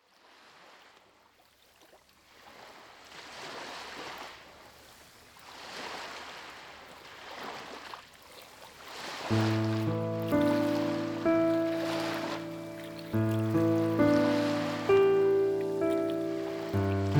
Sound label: Melodies on Ocean Waves